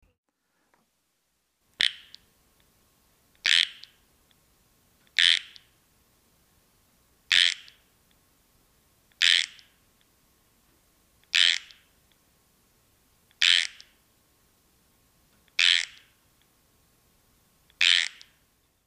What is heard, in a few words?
Pacific tree frog terr